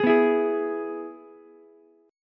G sharp Minor Sharp 5th, Ukulele; G#m#5 strummed; JGuitar

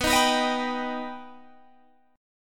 B Augmented B Guitar Open G, chord

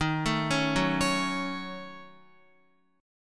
Eb Minor 7th Flat 5th, Guitar; Ebm7b5; arpeggiated; JGuitar

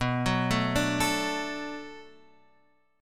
B Minor 7th B, chord

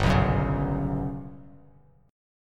G Major Sharp 11th G, Bass Standard; GM#11 strummed

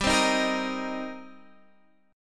D sharp 6th, G

